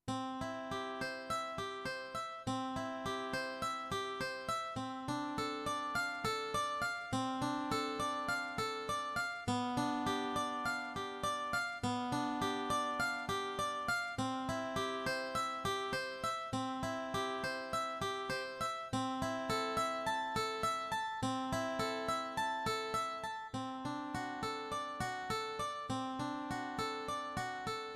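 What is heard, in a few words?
Steel Guitar, digital piano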